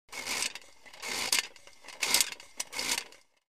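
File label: Metal Cranks Flour Sifter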